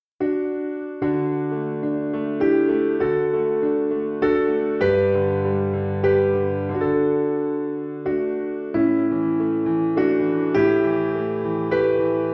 Song of Gratitude Piano Version; tokimeki memorial drama series vol. 3 poem of departure

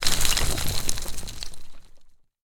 Explosion Debris; boomerang fu

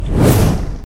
attack

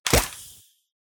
hand launch; Extendo Hands

